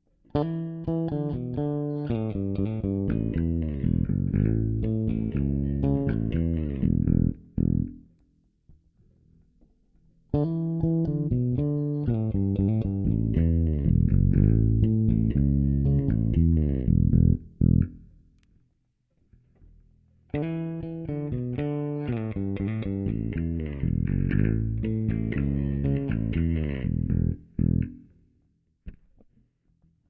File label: Thumb NT5 modes no drums, bass; warwick thumb nt 5